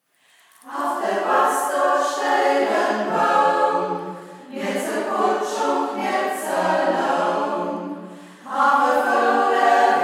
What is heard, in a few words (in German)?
singkreis; Singkreis Kampestweinkel; Ortsmundart: Braller; Än er Gåss, do stiht en Bånk; hegt wird gesangen